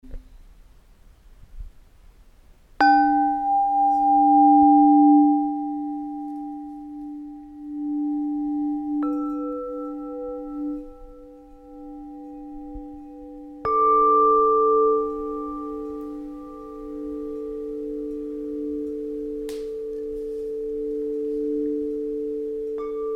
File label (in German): Klangprobe Klanggong